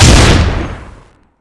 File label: shotty; balrog